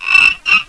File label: GOOD FROG